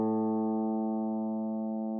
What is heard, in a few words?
A2 110.00Hz 8 string; tuner